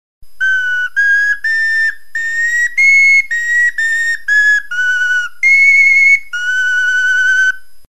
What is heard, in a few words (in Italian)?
Fischietto Multiplo